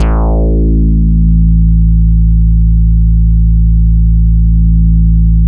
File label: BAS A1, 2 Step; USB Soundscan vol.51; House Side Of 2 Step; AKAI; BASS